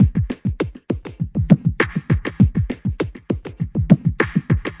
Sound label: 100 mellow clack n thump, drum loops and breaks; 091 to 100 bpm